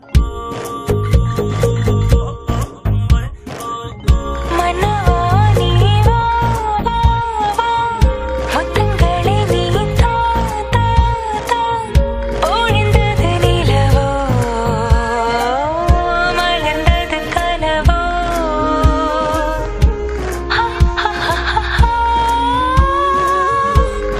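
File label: background Ringtone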